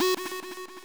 sub lead f#3

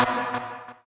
lead1 b2

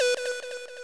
sub lead b3

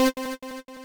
lead c4

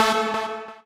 lead a3

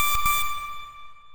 fami d4

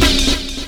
amen

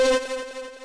lead c4

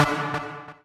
lead1 d3

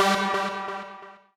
lead1 g3

